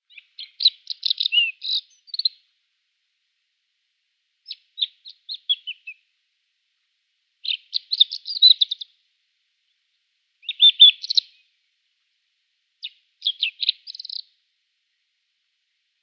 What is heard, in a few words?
Kordofan Lark song